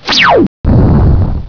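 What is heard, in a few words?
air to ground; spectrew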